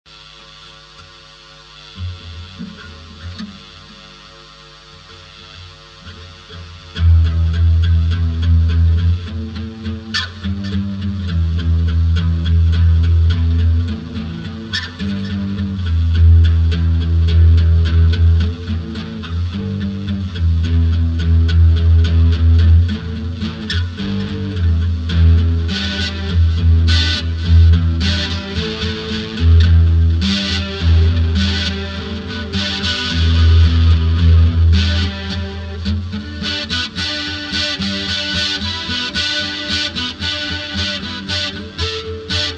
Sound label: without noise gate